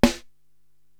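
snare drum Purple Funk, Drum Machines, Korg TR-Rack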